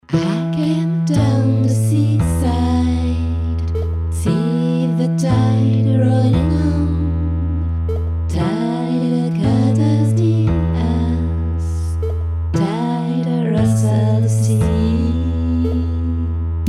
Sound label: refrain tenor